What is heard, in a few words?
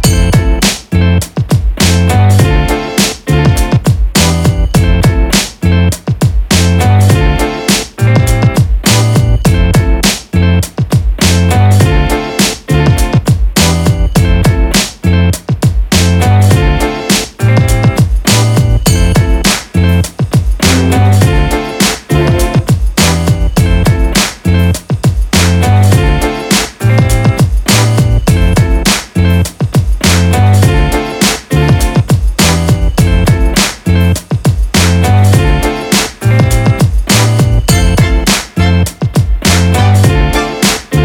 Sport Energetic Fashion Hip Hop Loop